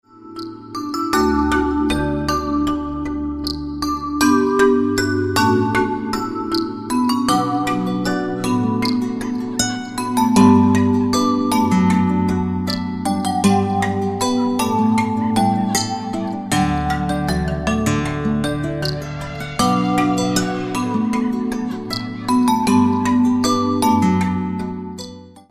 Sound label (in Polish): muzyka bez oplat